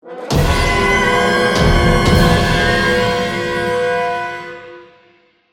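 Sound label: Music Stinger Snow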